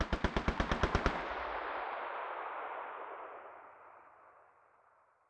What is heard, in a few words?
combat atmosphere; World of Tanks